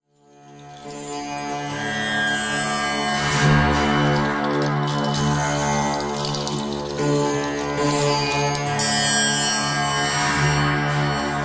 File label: tamb